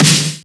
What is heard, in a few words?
Snare; FLPRO